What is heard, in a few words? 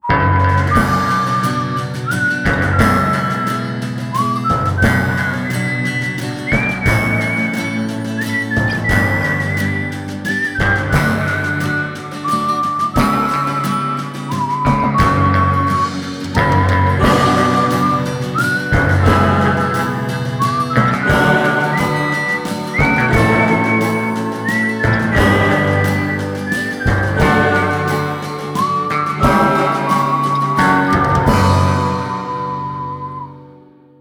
whistle